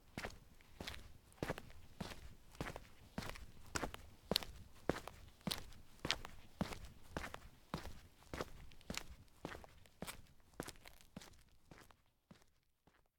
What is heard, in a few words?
Walk; Tyler; LOOPERS